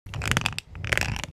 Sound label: fx rasp, Cartoon; Cartoon - film effects